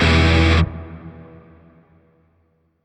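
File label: Shawzin Pentatonic Major Chord C; Warframe; Grineer Shawzin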